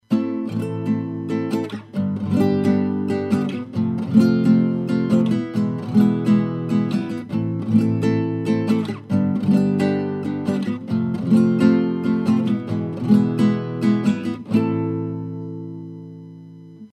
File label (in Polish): progresja flamenco rozciagniecie 2 uderzenia